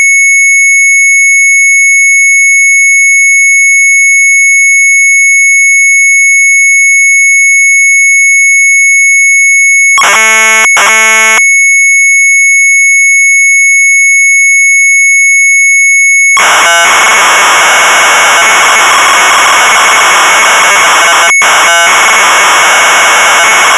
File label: MZ800 Tape, sharp; CIRCELS; retromelsarchive